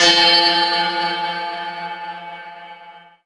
Multiplier, pinball; Treasure

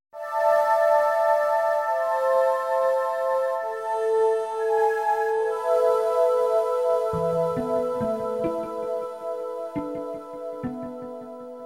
Six Horns

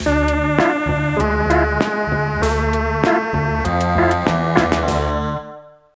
Nerd Fail Stinger; bully scholarship edition

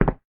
Tile short

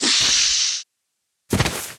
Snakewarrior Death

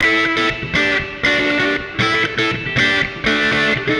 Guitar wet; Nimbus Station; lego universe macos windows gamerip 2010

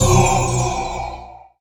SPELLS Drain power

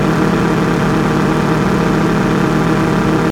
Mercedes amg Gt High; gamerip; Car Simulator 2; android

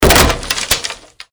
Fall Floor; Granny 3; Android

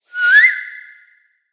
decoy whistle

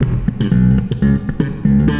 Bass wet; Nimbus Station; lego universe macos windows gamerip 2010

